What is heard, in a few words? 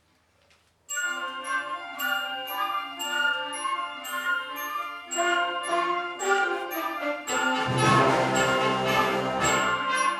Christmas Bells Are Ringing, band